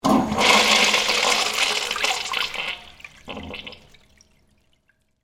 Urinal Flush, Office, Urinal, Flush, Public, Bathroom; Public Bathrooms